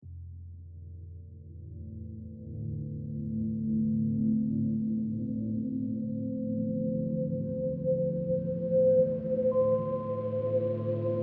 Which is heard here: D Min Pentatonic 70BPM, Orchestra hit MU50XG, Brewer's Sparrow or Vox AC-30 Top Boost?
D Min Pentatonic 70BPM